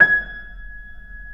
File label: keyboards acoustic G#5; USB Soundscan vol.17 - Keyboards Acoustic; AKAI